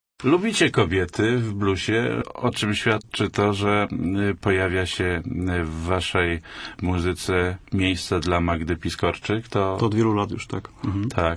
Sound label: blues flow